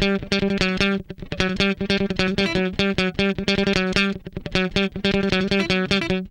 Guitar Licks

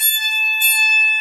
Psaltry Loop

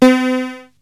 HOUSE PIANO, Siel; TECHNO-BWARE

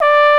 BONE, TROMBONES; Greytsounds VOL-1

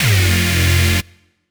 lead slip F

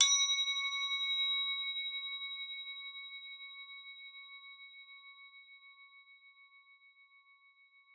CROTALES C#4; Xsample VOL-4

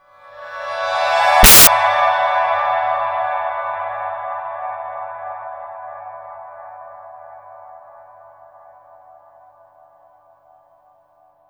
GLISSOLIA; Spectrasonic Distorted Reality 1

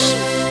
HOUSE, House Dance

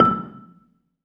BELA E5, BELAFON, Asian Traditions; USB Soundscan vol.60; AKAI

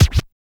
Scratch; Drums In Your FACE!

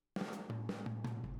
Boogaloo, Intro, Room, Drums, 82 BPM

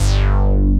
BIG BTM BS; Pro-Rec - Synthsonic

